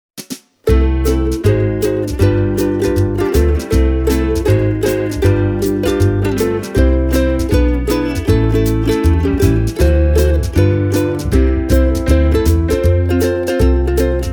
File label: Kala KA 15S